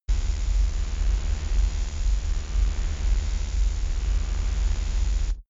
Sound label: space alien engine working hard